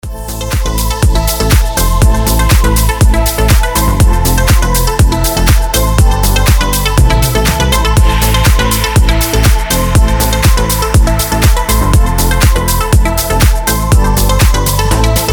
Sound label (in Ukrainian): Гучна мелодія